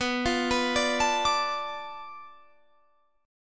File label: B Minor 7th Flat 5th B